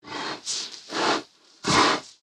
Pets Tortoise Idle; creatures; Lego

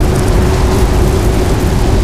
Ice Tornado Mid Loop; Spinjitsu

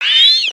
Approval, creatures pets

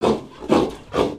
Pets Warthog Happy; creatures; Lego

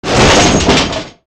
Racer Workbench; gnarled forest

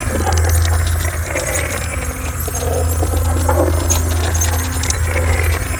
Rail Post Ice; Ninjago

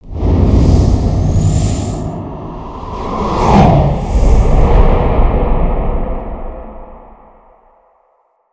Binocular Path, ambience gnarled forest

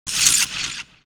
Battle Area Scrape; gnarled forest

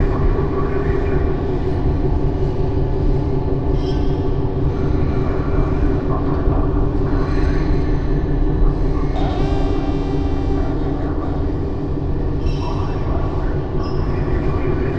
space surround, ambience spaceship; lego

